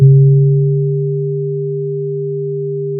pop, Sound of a church bell

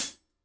ClHat, drum

